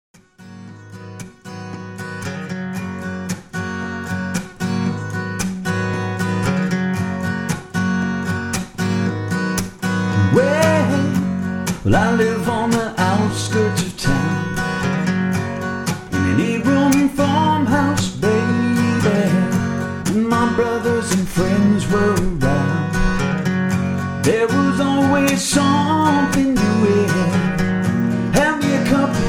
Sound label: Acoustic Guitar